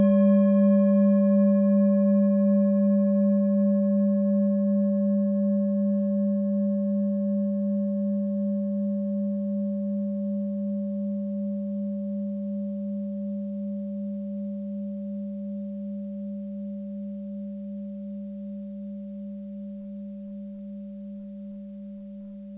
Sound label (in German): klangschale orissa; Die Klangschale kommt aus einer Schmiede